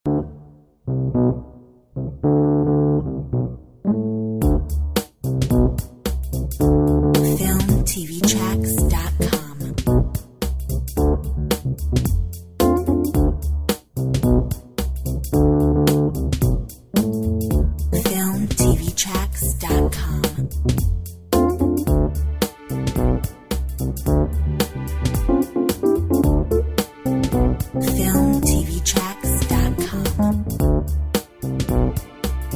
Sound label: Jazz Funk Train